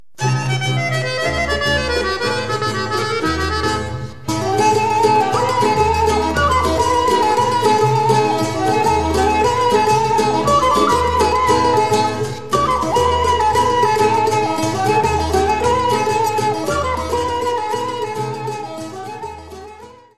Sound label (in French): Panagjursko Horo, Bulgarie